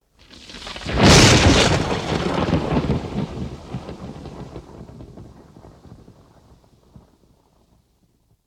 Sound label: Thunder Crack; Originally Thunder 02